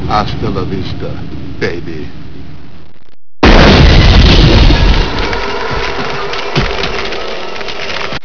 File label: baby